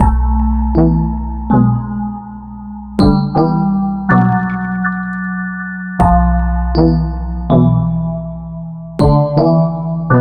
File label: stem intro; Pix the Cat